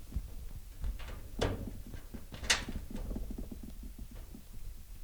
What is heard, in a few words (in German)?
gitternagen